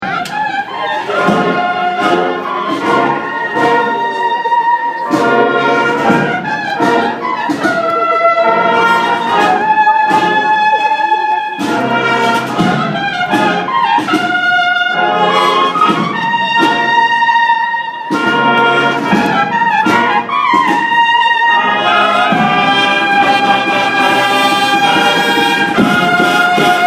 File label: Semana Santa Brass